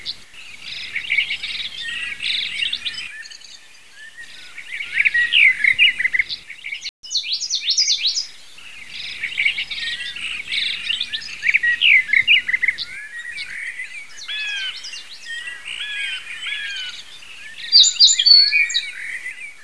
birdzy

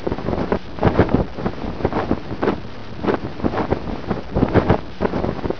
flag sound

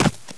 step

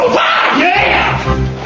headshot

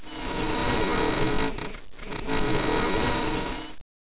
hanging

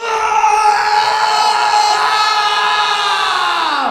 scream

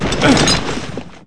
para land

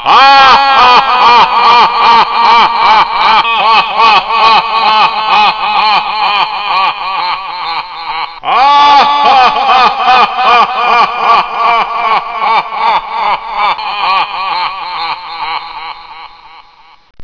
ghost; mineshaft

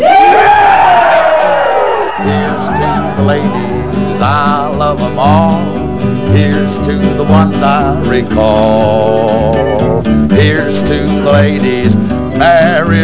win

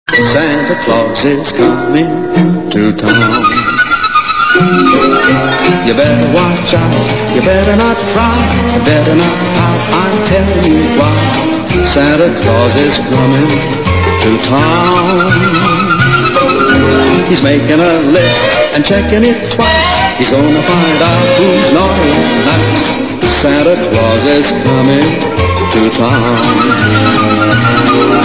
Bing

